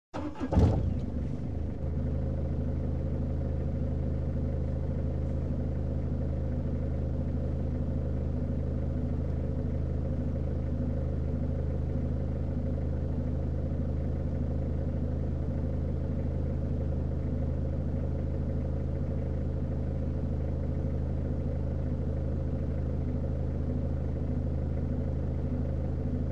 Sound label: PEUGEOT, 1.9 litre (diesel) int switch on, idle, switch off; BBC Sound Effects Library